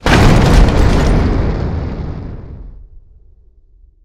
explosion tank

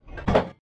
reload distant

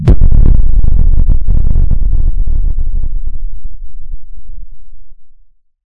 nuke

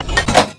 reload end

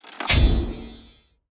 booster

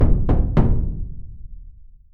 flag captured

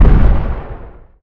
artillery distant

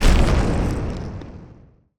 impact terrain

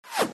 shell whizz

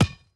ball kick

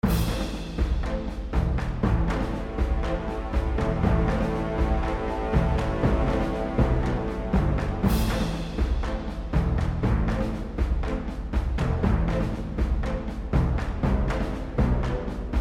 theme lobby

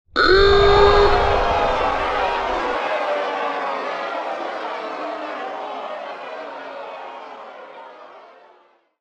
barrier destroyed friendly

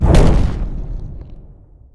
explosion bomb

